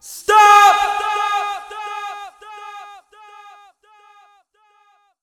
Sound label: STOP VOX -L, Funky Vocals, SHOUTS; USB Soundscan vol.18